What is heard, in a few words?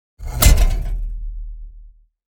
Metal wires subtle impact